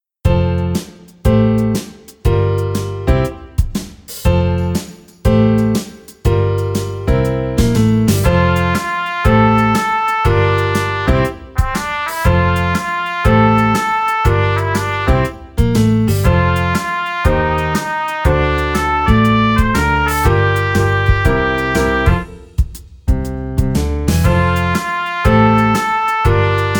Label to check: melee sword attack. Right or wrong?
Wrong